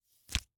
Slap bracelet, smack, slap, whip, felt, plastic, release, slow; Eiravaein Works; Vaeyan IV